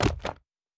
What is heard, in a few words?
Wood